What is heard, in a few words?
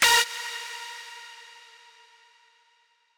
lead